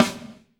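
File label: snare drum, Drums; Bob Clearmountain Drums I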